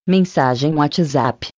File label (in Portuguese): Mensagem WhatsApp